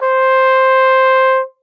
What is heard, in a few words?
flugel